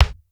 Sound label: DRY K; Crypton - DrumBase5000; R70 KIT #1